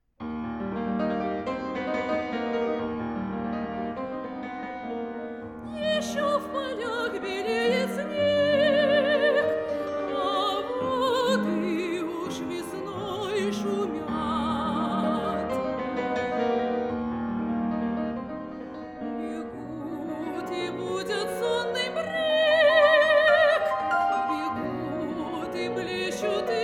soprano fortepiano; S.V.Rahmaninov; F.Tyutchev; Vesennie vody; Еще в полях белеет снег

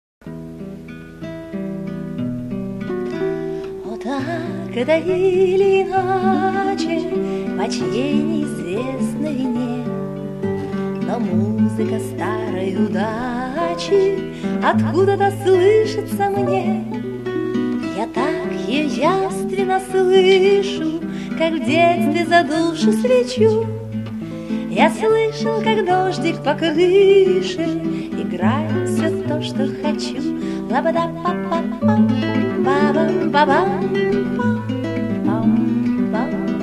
penie gitara; Ust-Kamenogorsk; sl. D.Samoylova

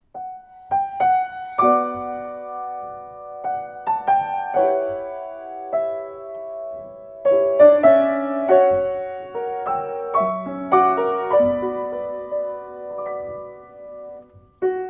fortepiano; A. Ahmatova

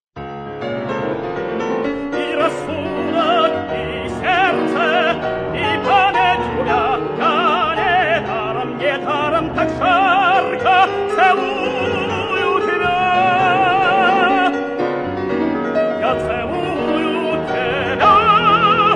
tenor; Taneev; Polonskiy

